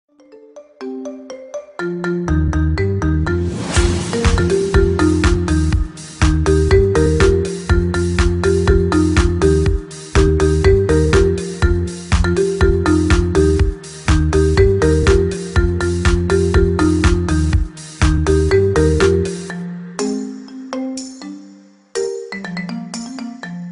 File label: Marimba